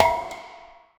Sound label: distant metal barrel hit, clang